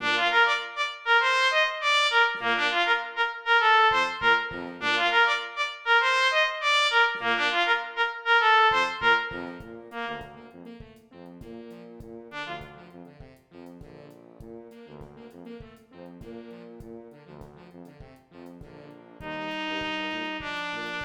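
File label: trumpet 2; Borgertog2019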